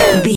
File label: retro impact hit